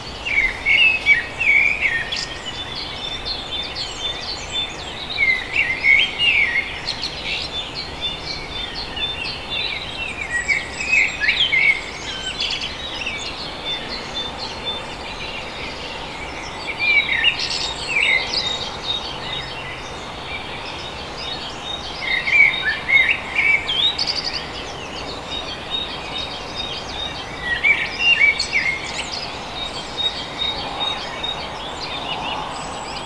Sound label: early mountain birds habitat; soundscapes